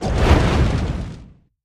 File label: Dragon Fire Whooshes; With Fire Crackle Element X 10